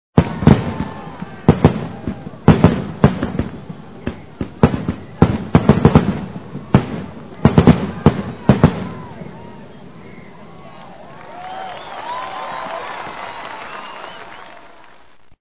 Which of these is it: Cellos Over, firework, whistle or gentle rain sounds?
firework